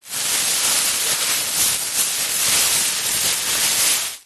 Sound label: foley plastic bag thin empty movement rustle handling long